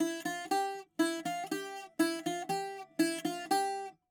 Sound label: bouzouki